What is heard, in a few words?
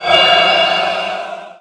siphon; baseq2; items